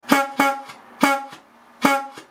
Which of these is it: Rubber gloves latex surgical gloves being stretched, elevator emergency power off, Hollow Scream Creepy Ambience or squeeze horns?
squeeze horns